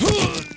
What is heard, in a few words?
FALL; PC Gamer 4.5